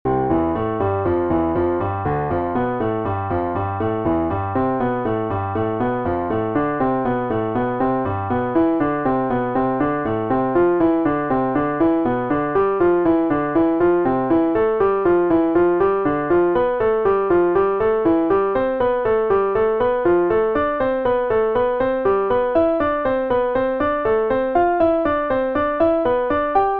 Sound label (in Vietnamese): bai tap luyen ngon piano